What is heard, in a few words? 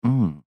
Human Moan; Moan Element Male 25 Yrs; Pain; Sound Effects:Voice